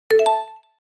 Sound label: quicktone